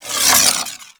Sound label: Proj Whiz; Enemies; Sentient Dolicholyst